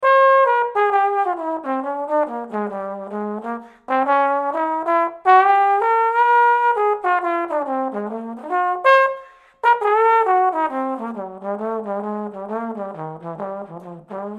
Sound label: Trombone